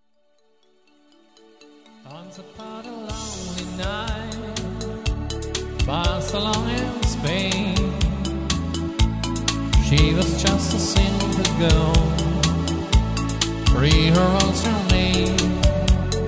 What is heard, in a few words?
spanish night